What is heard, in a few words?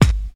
sharp kickdrum sound g key